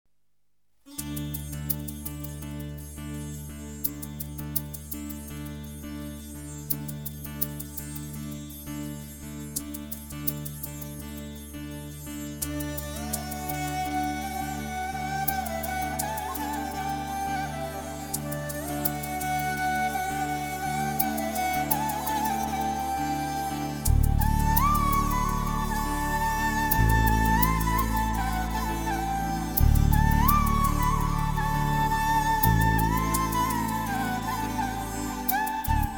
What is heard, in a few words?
Music Bit; tamil